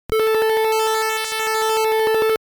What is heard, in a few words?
normal phase, LSDj Wave Synth